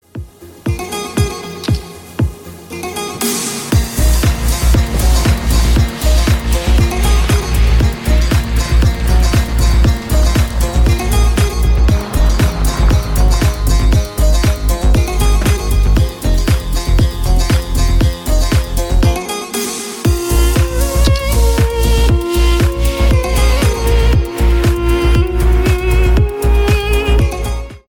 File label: huawei ringtone; reklam